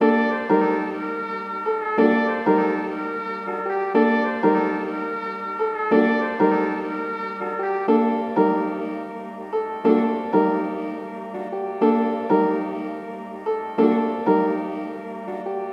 122 BPM G Min, Melody, Trap; Cymatics; 2022 Melody Collection; Trap Pt 2

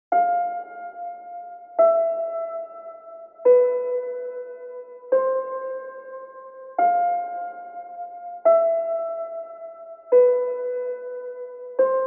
144 BPM A Min Piano; Melody; Loop Stems; Reverse; Cymatics; 2022 Melody Collection; Aim

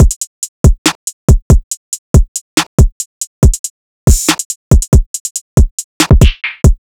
Drum Loop 140 BPM, Hip Hop; Drum Loops; Carota; Cymatics

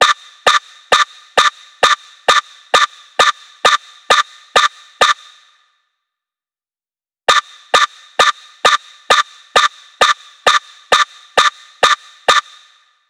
D Min 132 BPM Lead; Melody Loops; Loop Stems; Cymatics; Vanquished

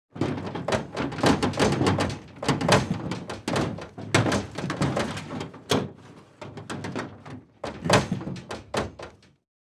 Jeep Metal Rattle Suspension; Fairlight Sound Lib

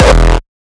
kick oneshot monster E; GhostHack

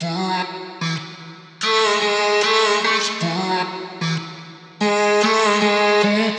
Vocal Loop Emaj 150BPM; Vocals; GhostHack; Ghosthack - Advent Calendar 2022 - Day 12 - Vocal Loops

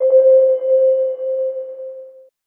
KEYS Mono (C); One Shots; Cymatics; Mystery Pack Vol 4

